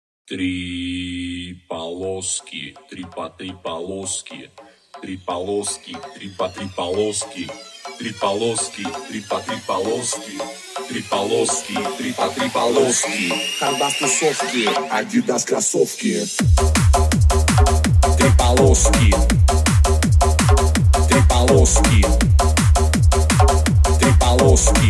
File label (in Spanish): canción electro